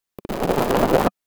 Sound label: bottle